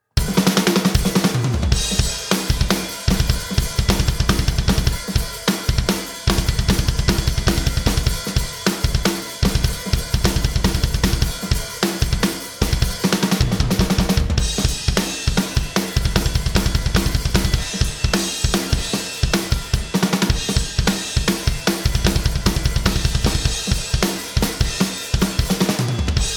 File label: drum test